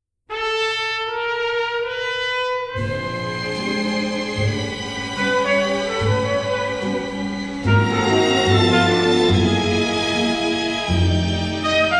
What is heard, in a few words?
Strings Orchestra Trumpet